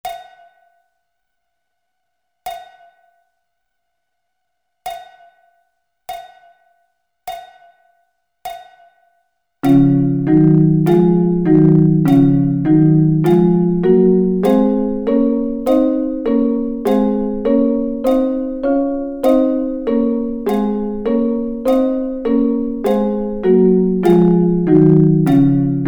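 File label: Double Stops, snare drum; Db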